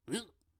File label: Zoteling yelp